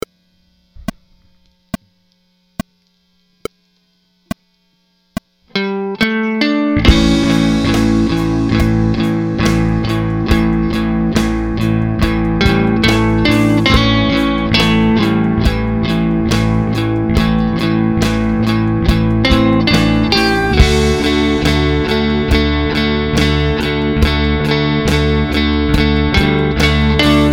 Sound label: 12 Bar in A Basic, 12 Bar Blues